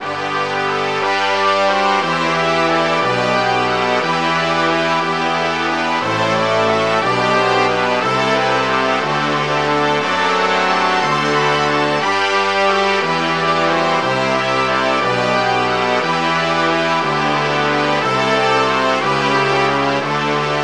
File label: brass section